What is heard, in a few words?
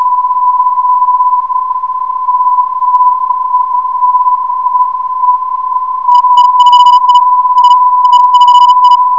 MUS 428kHz